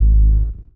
Laser Fire

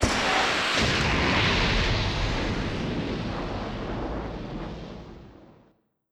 Booster Int

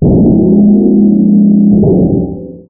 Torso Stop

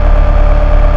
Engine Shift Fwd

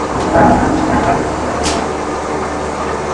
Fuel Tank

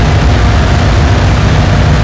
All Acceleration

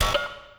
View Switch Right

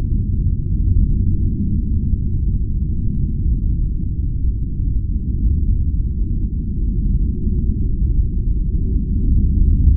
Generator

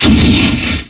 All Explosion

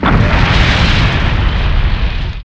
Death Int

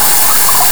All Coolant

